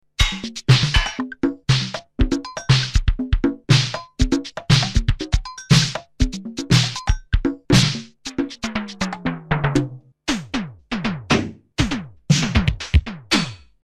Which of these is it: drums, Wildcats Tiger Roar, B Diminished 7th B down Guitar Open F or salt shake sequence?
drums